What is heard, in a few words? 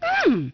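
female yum; People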